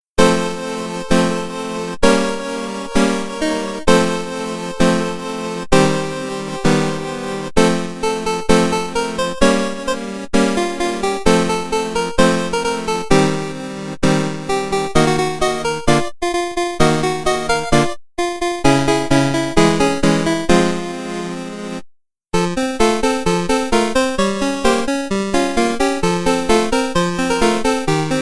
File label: Aria Chiptune